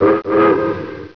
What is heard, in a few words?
Short Whistle; Railroad Tycoon